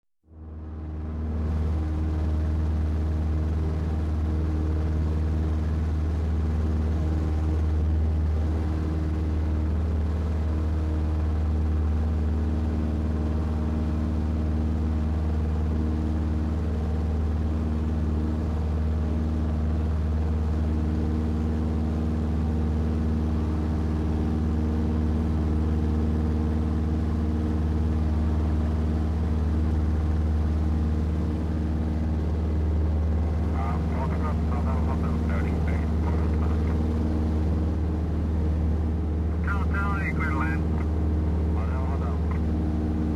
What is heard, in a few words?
Cockpit Ambience with Radio Communication